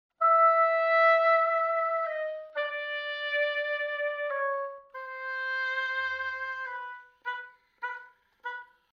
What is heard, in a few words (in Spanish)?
oboe